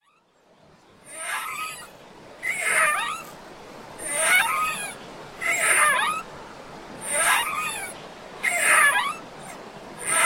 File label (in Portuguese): Baloiço em praia fluvial; Sobral